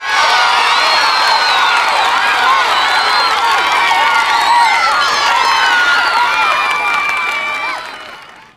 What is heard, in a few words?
cheer, ambience; Birthday Party Bash; Wii